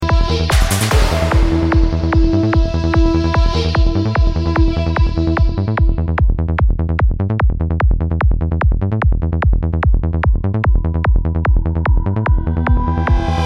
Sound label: Nice Drums